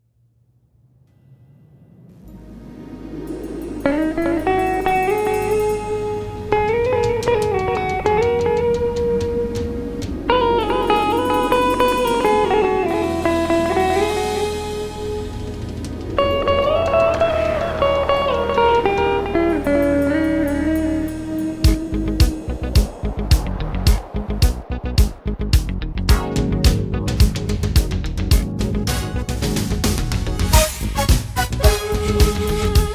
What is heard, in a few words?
minus karaoke